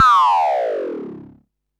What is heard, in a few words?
Perc Synth Raw